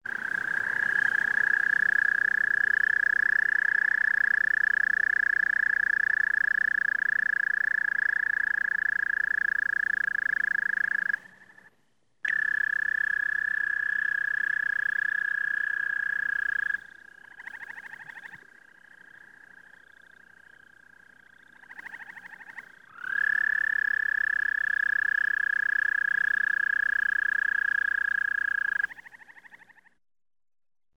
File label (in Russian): zhaba amerikanskaya kvakaet